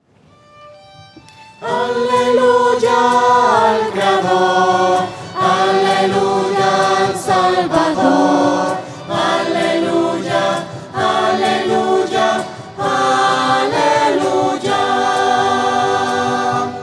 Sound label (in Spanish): aleluya al creador voces; misas 2012